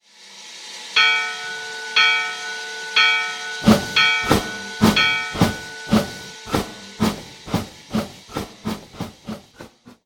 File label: Steam Bell; RailPro